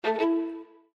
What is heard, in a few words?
Windows Pop-up Blocked